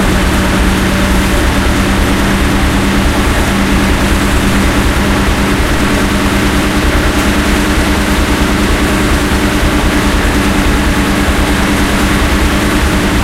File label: stug40 engine int rpm 33 load, engines tanks; War Thunder